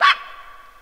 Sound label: dog bark; Animals